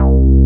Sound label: SYN BASS